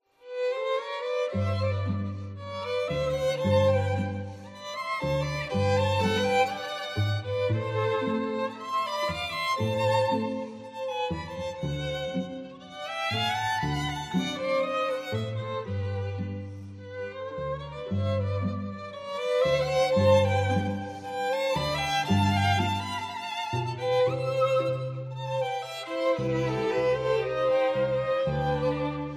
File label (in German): Klassik Tango